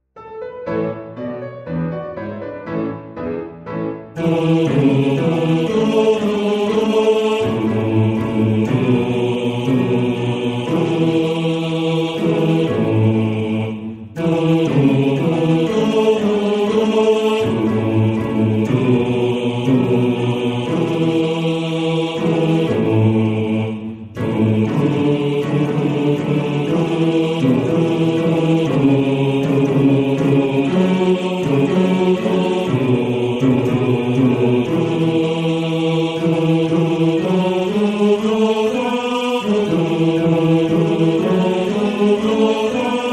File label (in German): Bass; Soldateliedli; Bass 2